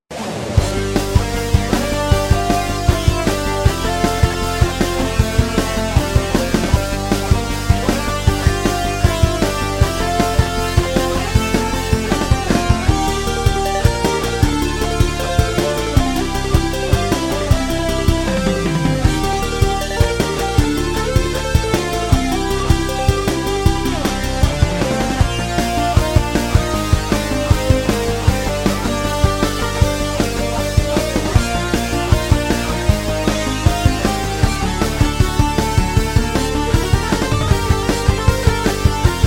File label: Rock; Blizzard; Mega Man 6